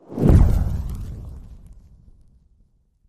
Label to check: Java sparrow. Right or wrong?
Wrong